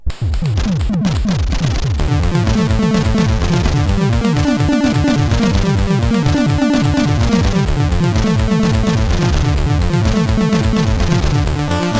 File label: Knock Remix, sid, CSG8580R5